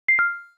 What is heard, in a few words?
cam stop